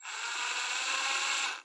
drill regular short; SoundsCrate

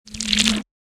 water swell; soundscrate

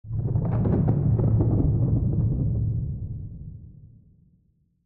Thunder Crack; soundscrate

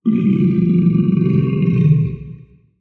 big dino growl

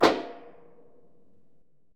metal trashcan lid pop; soundscrate

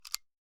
Shotgun Loading; SoundsCrate

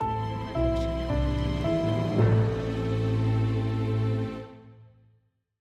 Mystery Ident; soundscrate

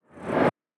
woosh

